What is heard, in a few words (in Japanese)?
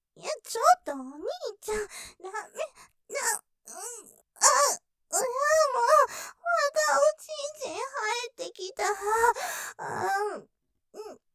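喘ぎ◆トロ声喘ぎ; 挿入！お兄ちゃんダメだってば・・・！; ループで楽しむ！シェフの気まぐれ抜き抜きコース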